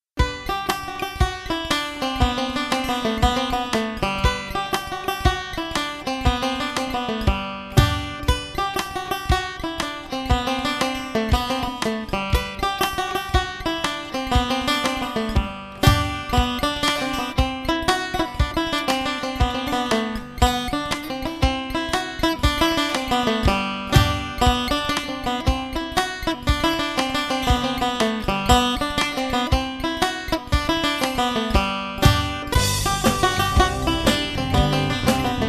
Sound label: woodcutters jig, banjo